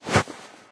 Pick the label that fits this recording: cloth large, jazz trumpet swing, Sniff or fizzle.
cloth large